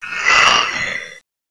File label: alert